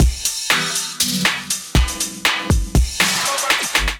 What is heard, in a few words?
Pico Dilly Kit, Drums; Ableton; Factory Packs; Skitter and Step